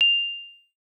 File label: Open, Nokia E52 Themes